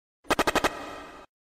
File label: poison sound effect; pokemon red blue yellow